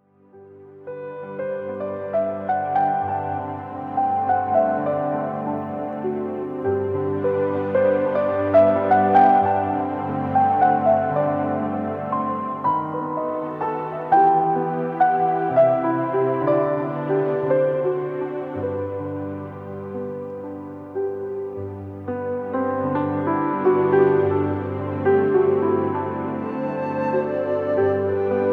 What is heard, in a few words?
Piano Appassionato; relaxation gentle whispers